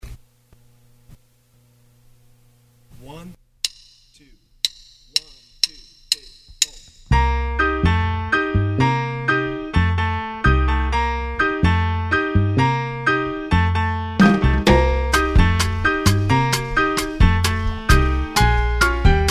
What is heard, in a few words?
with drums